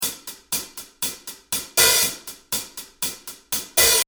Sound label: hat